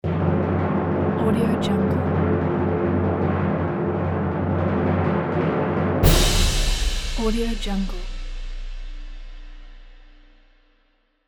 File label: timpani roll with cymbal; audio-therapy